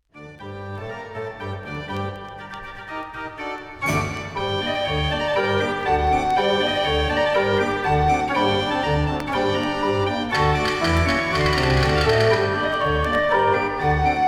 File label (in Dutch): draaiorgel; op mars met de turk kristall